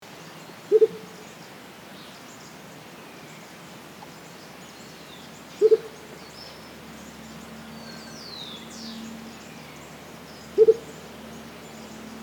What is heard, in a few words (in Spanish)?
Momoto Serrano Momotus aequatorialis